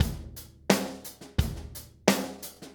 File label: 087 Steady Grooves, Drums; Pop Rock; Moderate Tempo; Pro Tools Essential Loops; Digidesign